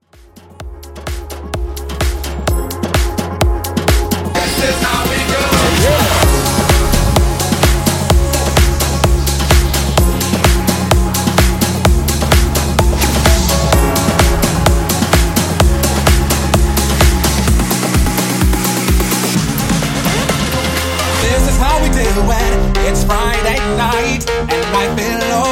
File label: 128 Bpm